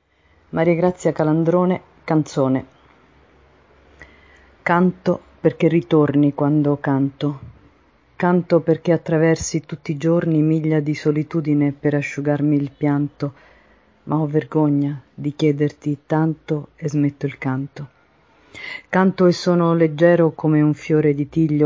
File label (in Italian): canzone